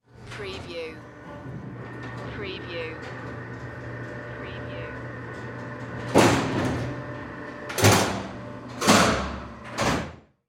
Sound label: Jail Cell Doors Close; Sound Effects Bible